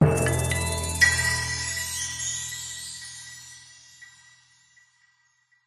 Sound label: musical logo branding signature percussive